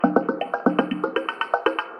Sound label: beat 120bpm Loop